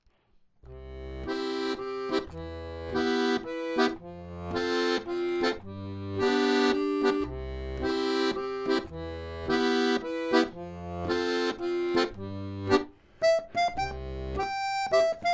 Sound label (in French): mazurka de la pluie; composé par Jan-Maria Carlotti dans l'album / Salabrun